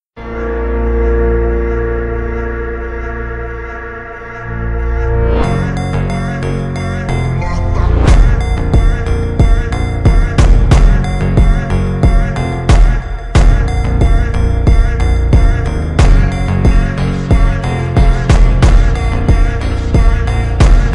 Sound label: super slowed reverb brazilian phonk